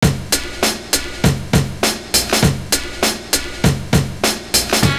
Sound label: HIP SAX; Univers Sons - Kitch 70s